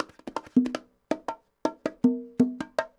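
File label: congas bongos 80 bpm, latin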